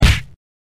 touch wheel; cowboyrun

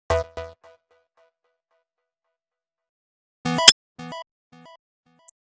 play button 2 variations; Maze-Lover

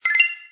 magic tokens game fx; Jumping Angry Ape

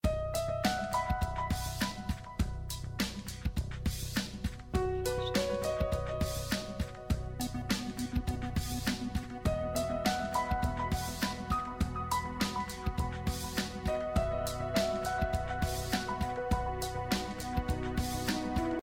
music theme; bubble shooter

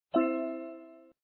buttons; bubble shooter

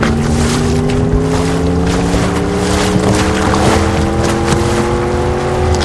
engine; Waterraft

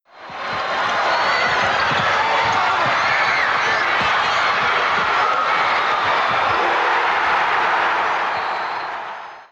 crowd idle; Downhill Ski

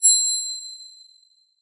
shine; nonzerobot; Maze-Lover

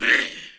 skeleton die; Knights-Diamond

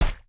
fruit strawberry; fruitslasher

